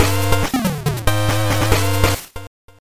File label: Draw Jingle; Natural Selection